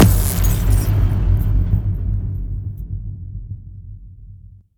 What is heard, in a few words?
Vehicle Explosion; Redmatch 2